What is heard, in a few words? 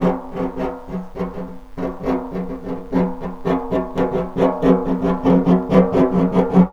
Snare Drum; Percussion Samples